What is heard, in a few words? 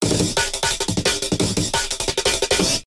Amen Breakcore 175bpm